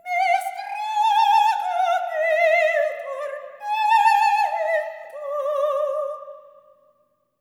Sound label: SOP. TRAD, Symphony of Voices; Spectrasonics